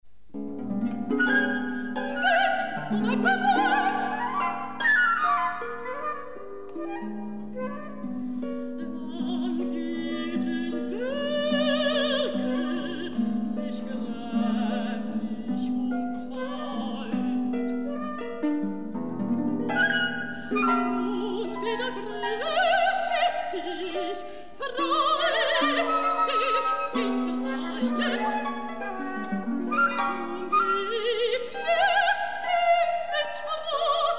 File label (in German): Mezzosopran, Floete und Harfe; Sieben Gesaenge fuer Mezzosopran, Floete und Harfe, Nr. 7 Wind